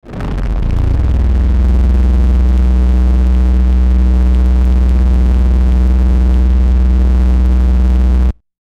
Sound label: Electrical Distortion Swell Dark Buzz; Blastwave